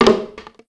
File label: debris, ambience; ambient, ambience, rainscapes, rain; Left 4 Dead 2